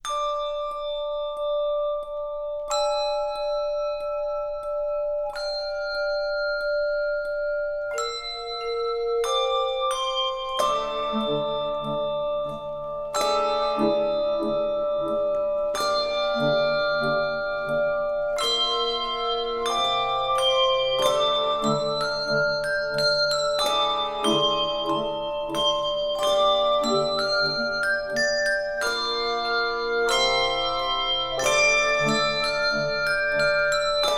Handbells 3-5 Octave

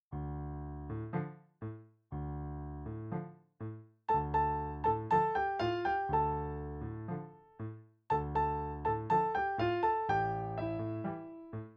Tango, Easy Piano Duets

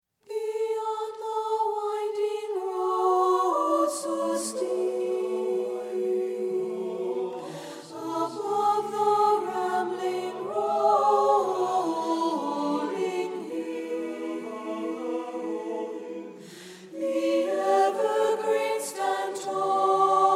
Alto, mixed choral

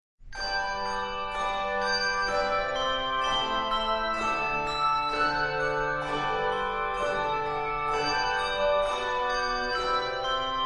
Handbells 3-6 Octave; Jeffers Handbell Supply; Arranger: Mallory, Ron

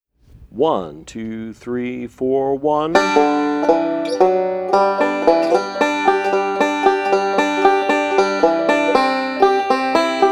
Generic Bluegrass Song; Alfred Publishing

